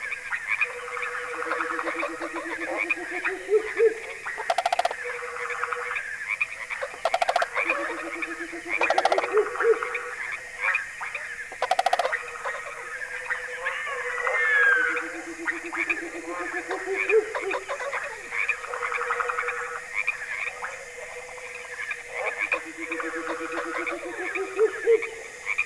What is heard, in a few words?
Swamp